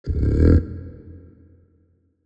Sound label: kaiju adult pre chomp; biogenesis